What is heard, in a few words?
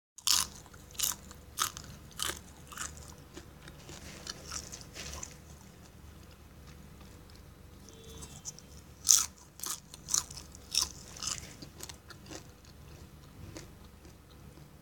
pop